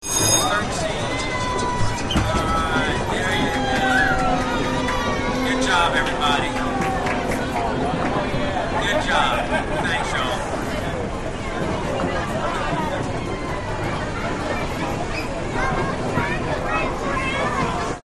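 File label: Amusement Park Bell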